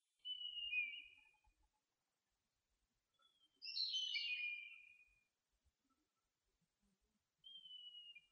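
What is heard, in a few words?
eastern buzzard call; nosuri nakigoe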